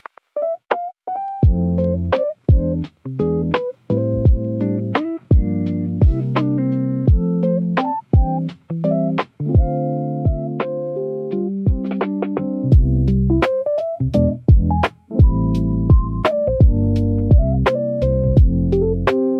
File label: sento evening drip, lo-fi